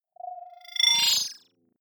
sci-fi computer awake

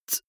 beatbox hi-hat

